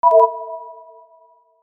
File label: confirm